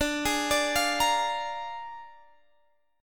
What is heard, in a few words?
Bb 7th D, chord